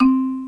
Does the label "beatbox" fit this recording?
No